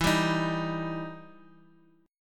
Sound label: E Minor Major 7th E down